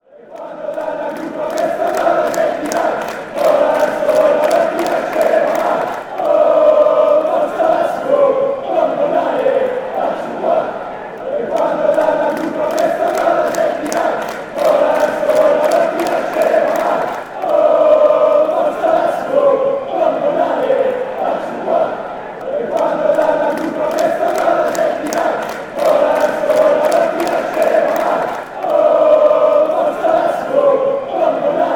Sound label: lazio chant